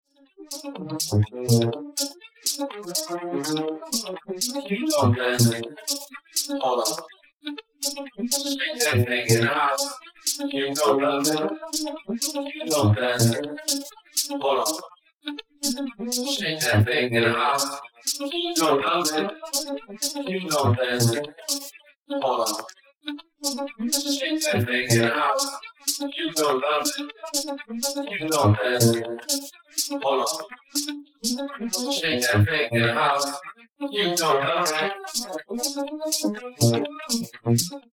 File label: Vocals